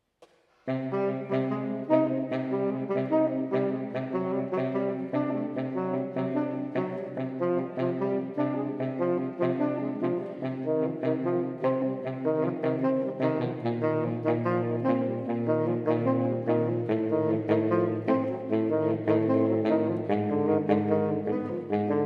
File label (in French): Quatuor de saxophone; Sax-Folies